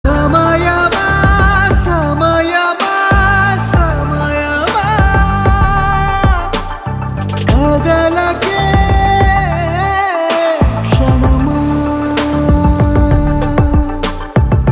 Male Voice Ringtone

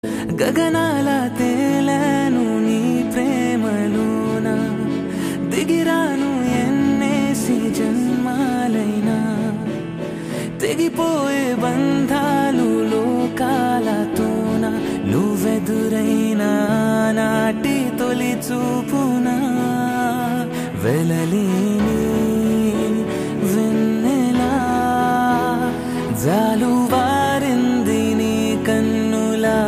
Ringtone Bgm

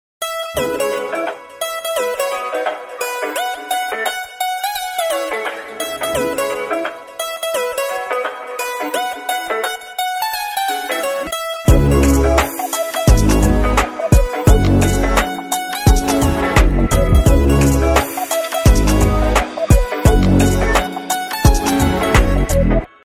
Instrumental Ringtone; Solo Brathuke So Better

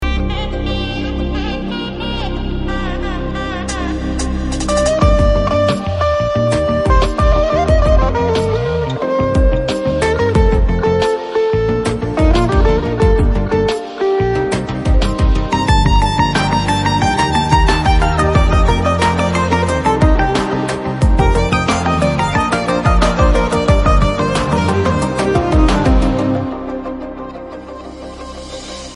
Music Ringtone

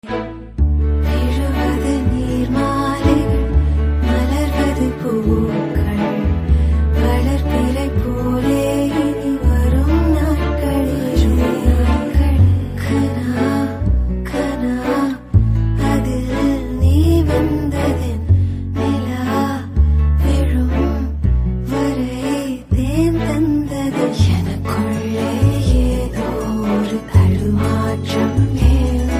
Ringtone

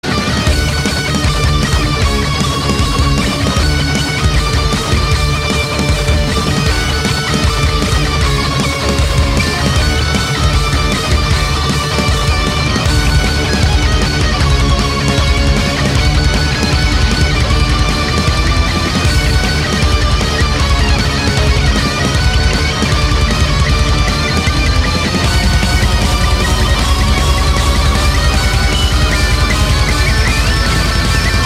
Electric Guitar Ending Tone

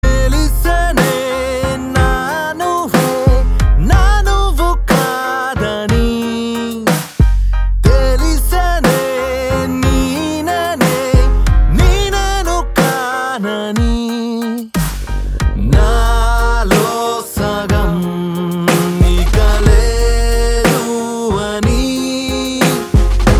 Breakup Song Ringtone

